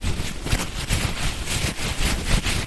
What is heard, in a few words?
rustle rustle